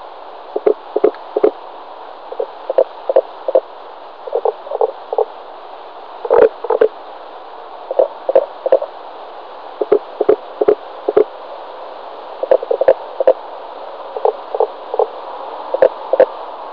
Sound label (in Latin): pelobates fuscus